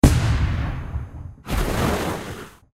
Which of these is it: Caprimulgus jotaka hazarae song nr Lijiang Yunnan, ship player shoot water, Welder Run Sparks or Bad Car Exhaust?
ship player shoot water